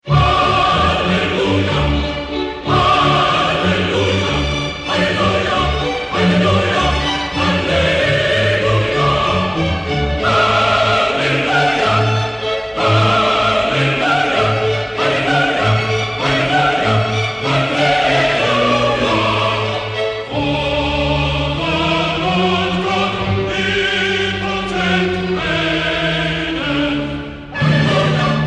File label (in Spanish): Musica de Barroco; honduras musical 8vo grado